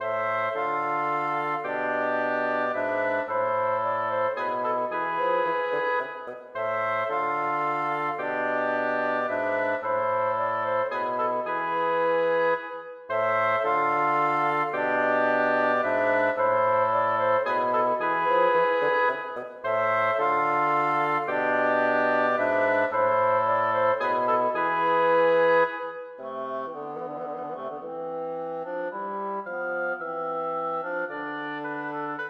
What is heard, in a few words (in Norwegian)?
Menuet for blåsekvartett uten cembalo; Hertz